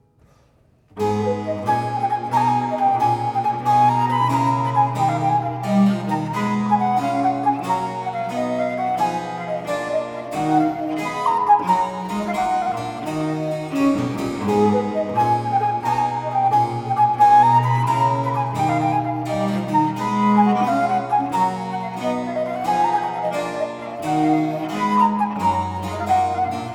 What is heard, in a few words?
Flute Sonata in a minor Allegro